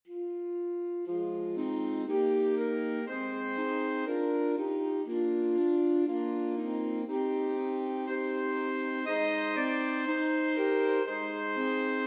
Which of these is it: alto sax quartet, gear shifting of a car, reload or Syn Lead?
alto sax quartet